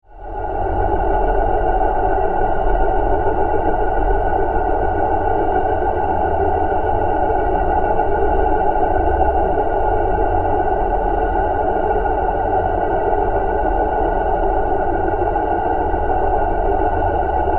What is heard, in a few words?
Drone, Alien, Power, Source, Scifi; 5.1